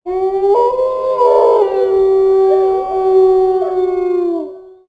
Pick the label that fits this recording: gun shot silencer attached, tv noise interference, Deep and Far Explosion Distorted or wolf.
wolf